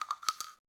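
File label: case 12 gauge; projectiles; cases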